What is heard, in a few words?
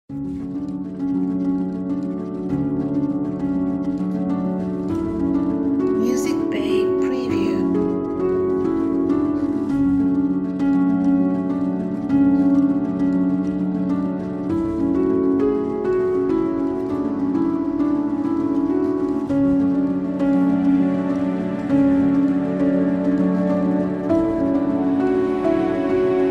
Shore Waves